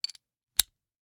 Rachet, vanadium steel, 8in, standard, locking, clicking, mechanical, new, socket lock, clean; Eiravaein Works; Vaeyan IV